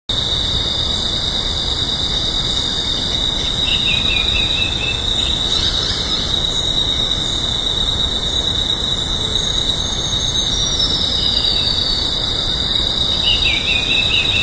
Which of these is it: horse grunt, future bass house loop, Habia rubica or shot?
Habia rubica